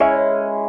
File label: Organ synth; Piano & Keys; Crowdsourced Sample Pack